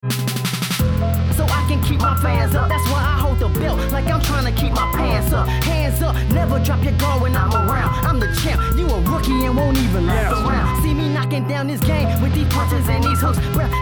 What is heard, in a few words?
Hip-Hop